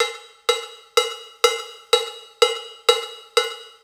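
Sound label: Hip Hop Cowbell, 125 bpm; Percussion; Digidesign; Pro Tools; Pro Tools Essential Loops; Bells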